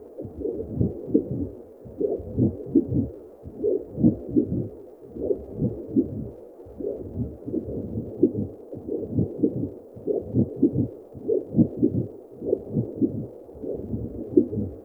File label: Infested Vein Beat; Ambience; Props; Warframe; Infested Eris